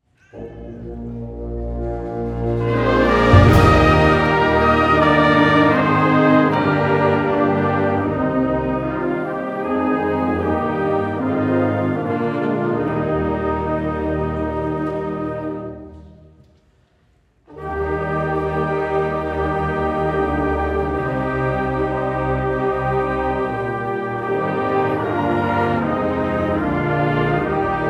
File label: Jingle Bells Fantasy, concert band